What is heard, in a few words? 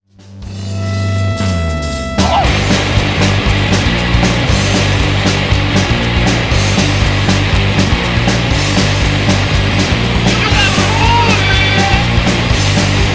Wrecked Chords